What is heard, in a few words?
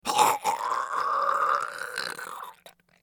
scav death; eft